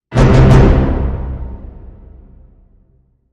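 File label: Hit Orchestra Tutti Triple C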